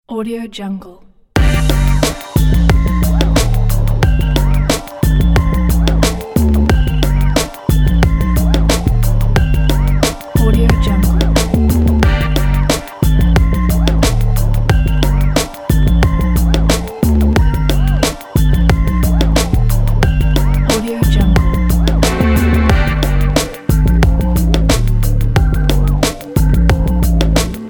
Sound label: street trip hop loop